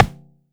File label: Percussive